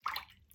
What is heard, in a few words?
water